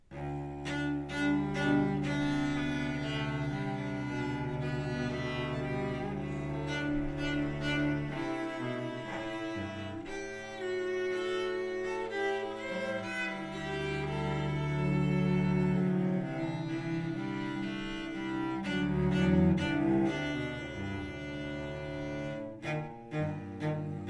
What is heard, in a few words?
Cello Choir Etude